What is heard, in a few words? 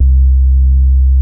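SUPPORT BASE, BASSES; Masterbits; Vintage Voltage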